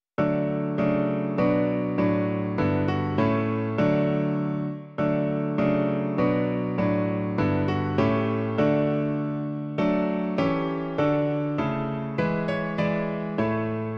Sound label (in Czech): klavír; Klavírní verze